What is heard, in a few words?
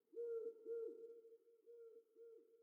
sting owl; Rust